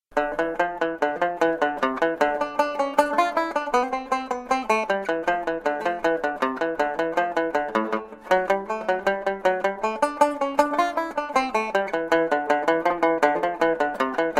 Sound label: Leddy From Cavan medium, banjo